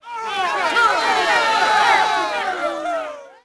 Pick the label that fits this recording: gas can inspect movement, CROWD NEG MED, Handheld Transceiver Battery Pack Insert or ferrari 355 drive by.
CROWD NEG MED